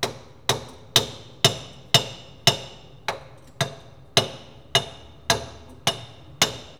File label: Hammering, INDUSTRIAL